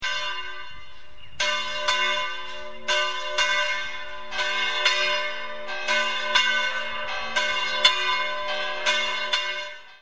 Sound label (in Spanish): campanas, segovia